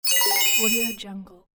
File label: space technology button